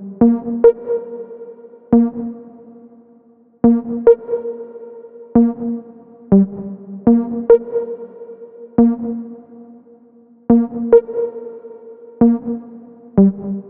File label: Root G 140 BPM; Vengeance Samples; Special Sounds